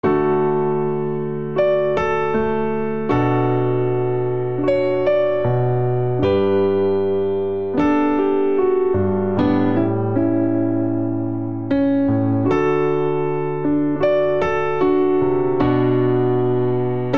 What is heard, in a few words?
Progression Pop Two Handed I - vi - IV - V D Major 77 bpm, Grand Piano; MIDI Clips; Ableton; Factory Packs